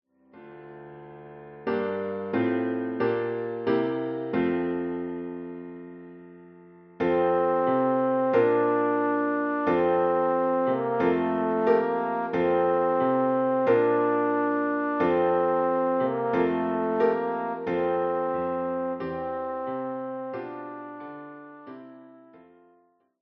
O du fröhliche

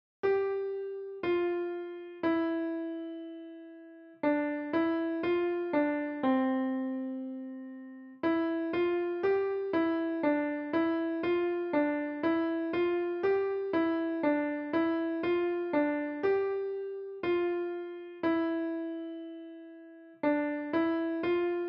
Klavier

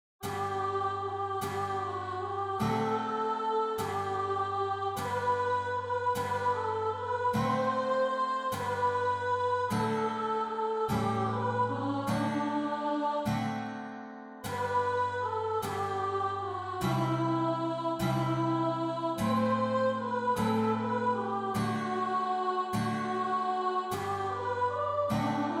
Bunt sind schon die Wälder

